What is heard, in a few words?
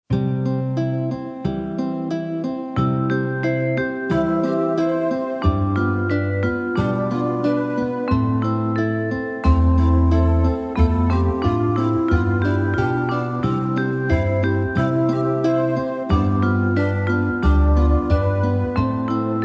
instrumental